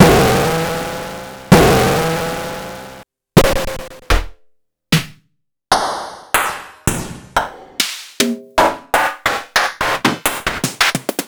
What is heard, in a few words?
Snare c